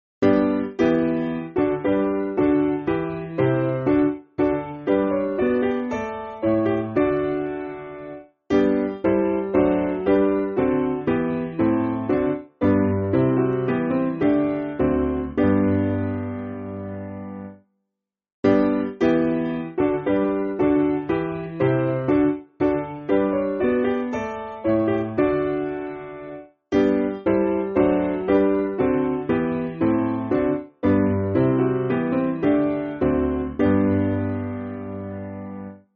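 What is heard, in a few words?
Piano, Music Backing Files for Churches; We Thank Thee Lord; Farrant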